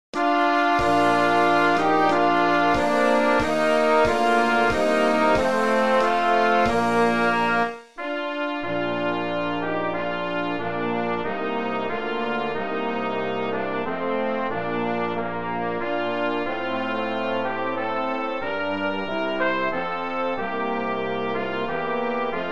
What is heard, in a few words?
We Gather Together playback